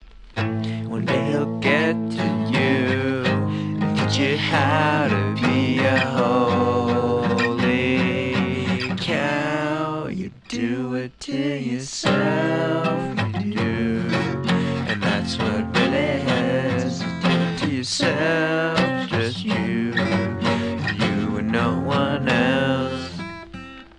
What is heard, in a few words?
just chorus